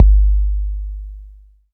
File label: BD, BD Dance; Sound & Vision; Gigapack I CD 1